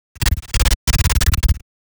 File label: Amp Stutter Glitch Chopped; GlitchBlog